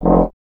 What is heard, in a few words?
tuba; Miroslav Vitous - Solo Instruments VOL-2